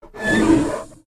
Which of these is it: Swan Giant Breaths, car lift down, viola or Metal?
Swan Giant Breaths